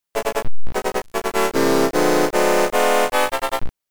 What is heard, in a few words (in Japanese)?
予選ゴール・ミュージック(ポールポジションでないとき)